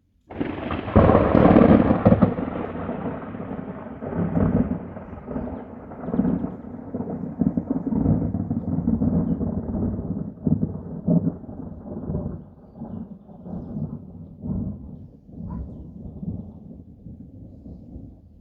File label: Lightning Thunder Strike Rainless Storm